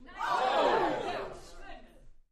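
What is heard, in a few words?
Crowd 15 Interior Rowdy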